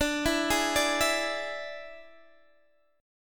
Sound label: D Suspended 2nd Flat 5th D, Guitar, Open D